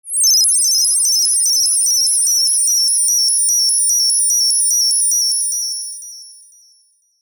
science fiction teleportation data beeps slow at end; zapsplat